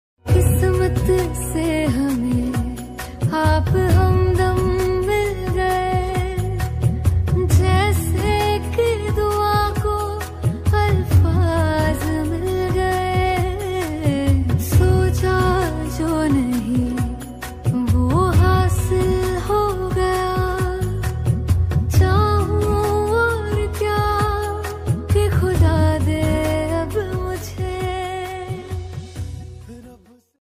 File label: female version; khuda hafiz